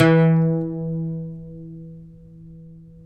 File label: OUD E; Guitars; Spectrasonics; Hans Zimmer Guitars VOL-2